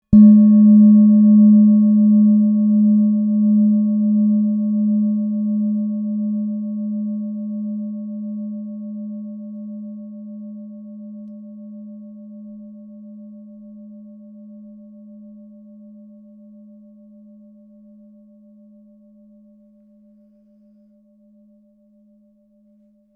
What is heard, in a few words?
singing bowl moon 1033 g